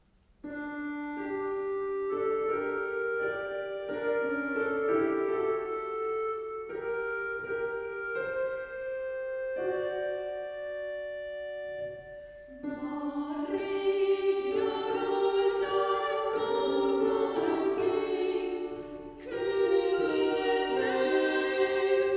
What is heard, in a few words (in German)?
Sopran und Alt; Maria durch ein Dornwald